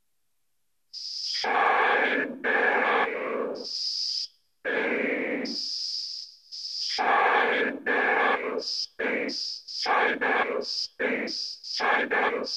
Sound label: Vocorder